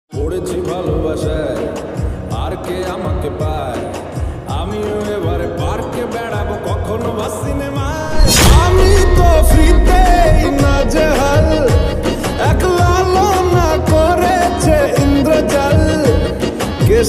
Lofi remix bengali ringtone

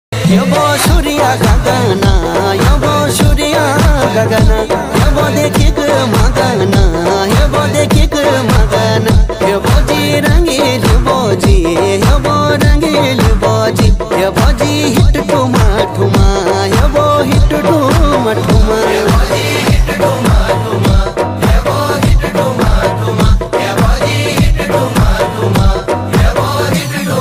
uttrakhandi ringtone

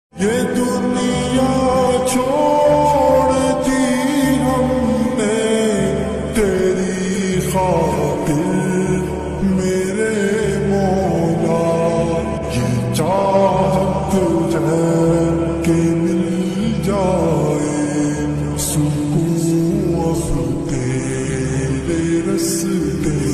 islamic ringtone

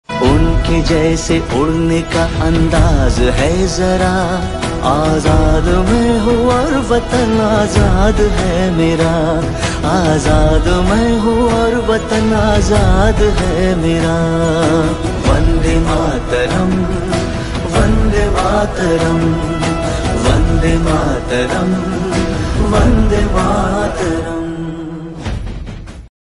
Old version hindi ringtone

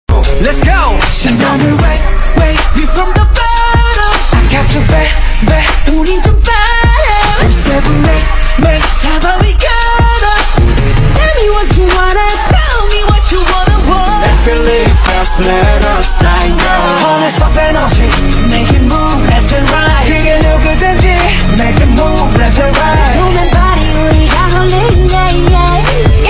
korean ringtone

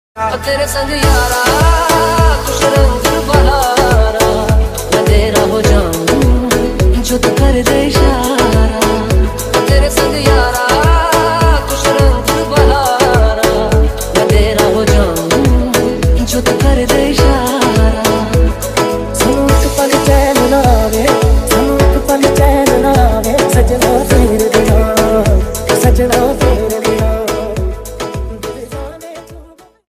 Hindi mashup remix ringtone

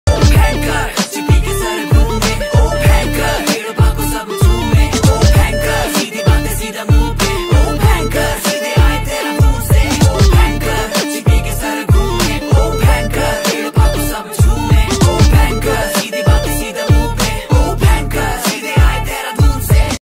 uttrakhandi ringtone

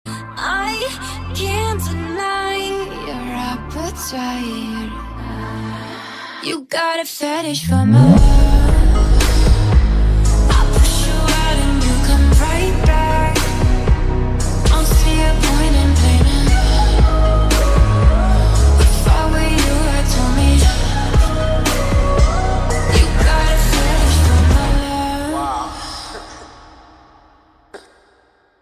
english ringtone

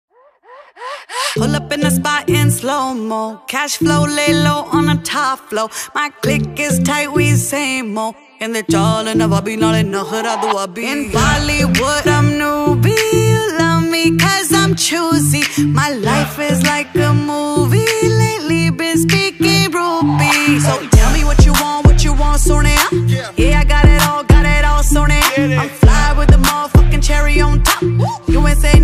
punjabi ringtone

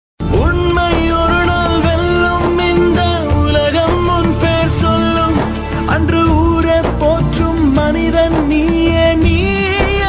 tamil ringtone

